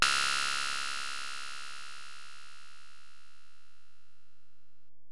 HiHat, Alesis DM-PRO

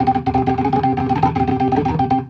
Riff; InVision Interactive; Keith Emerson; The Most Dangerous Synth and Organ; ORGAN RIFFS

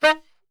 D#2 sax shot; Giga Samples Collection

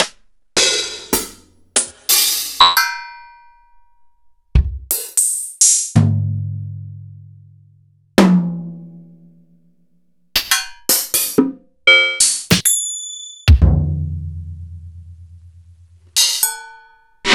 EMU PX-7; Sliced; m8

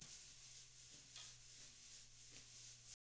TAMA BR SW, Drums; ILIO; Double Platinum Drums 1

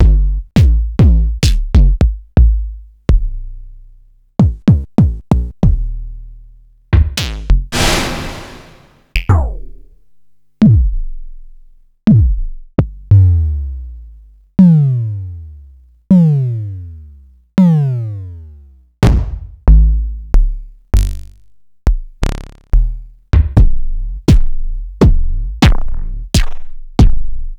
Quasimidi Rave-o-lution-309 dry kicks

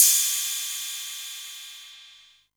Cymbal, Novation Drumstation

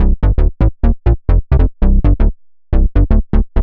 Bass, uk garage, 132bpm

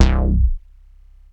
Bass Synths hits and octaves

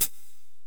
Korg x5, Drums

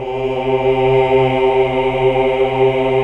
AAH; Best Service Gigapack 1

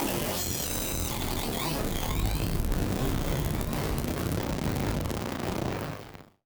Malfo Syn, malfunctioning synth

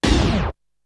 Hit; ZERO G - Datafile DISC 3

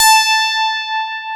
PIA DX E-PIANOS

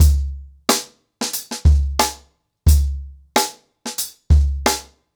90BPM, ACOUSTIC DRUMS

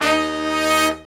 BRS SHRT, R&R Horns, Sect; Roland L-CDX-03

